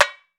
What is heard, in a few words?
TAMBORIM, Latin Drums; Latin Drums & Rain